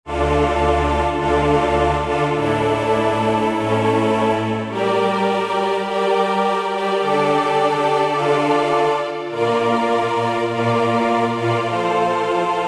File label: Str+Choir; Roland SR-JV80-09 Session